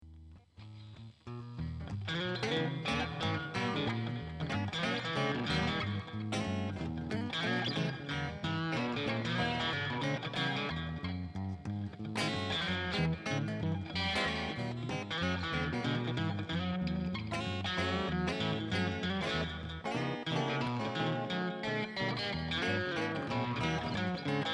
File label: blues